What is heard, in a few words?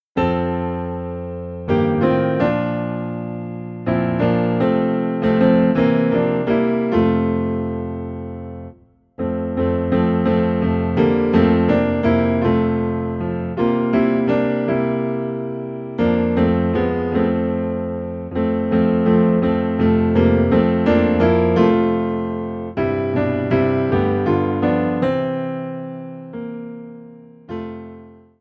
Piano Low in F